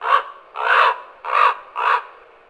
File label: raven